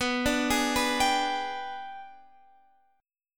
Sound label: Ab Minor Major 7th Flat 5th